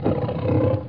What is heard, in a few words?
roar